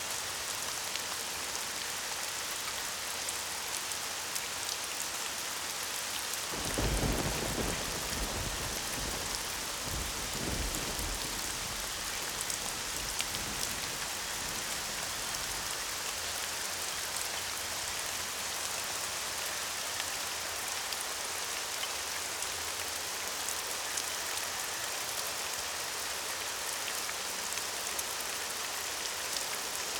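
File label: Night Storm Ambience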